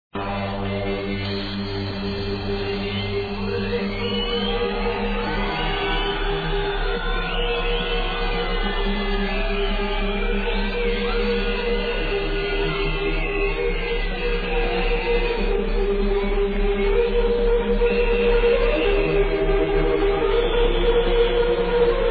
trance

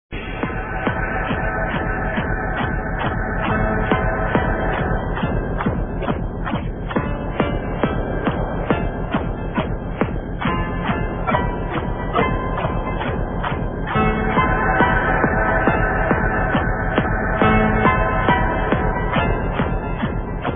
piano

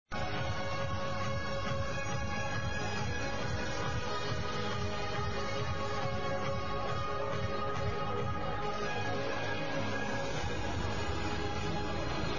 trance

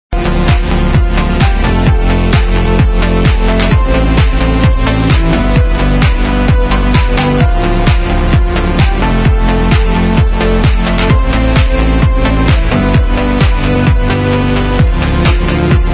electro

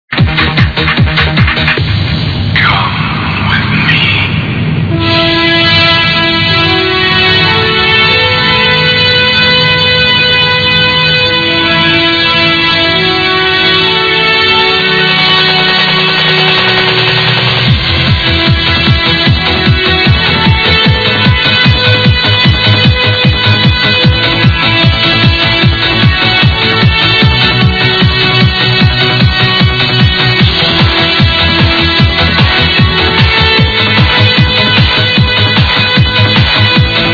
trance

